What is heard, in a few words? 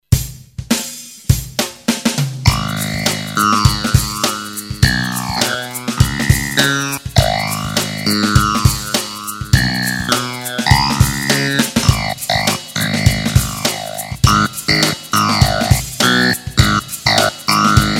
clavi with solo